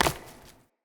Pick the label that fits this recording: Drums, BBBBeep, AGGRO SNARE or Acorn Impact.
Acorn Impact